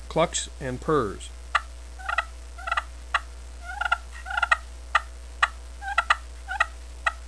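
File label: alum clucks purrs, turkey call; primos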